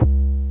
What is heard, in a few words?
Commodore 64 SID kick, 8bit SID Drums; LO FI and 8 BIT KITS